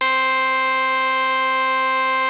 basses; Wizoo - Powered Wave

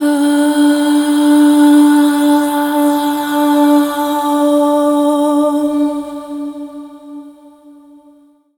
Dance Vocals, FEMALE

